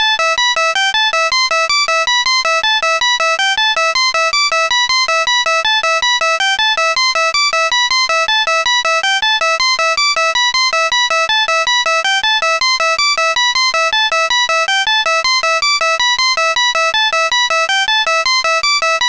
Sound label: tubular bells; Deluxe Music Construction Set